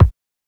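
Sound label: HSE-BD, house bass drum; X-Static Goldmine 1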